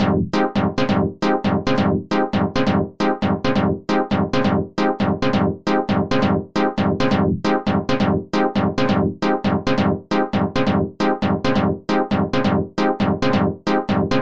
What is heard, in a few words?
135 busy 7th stabs; KEYS ORGAN PIANO LOOPS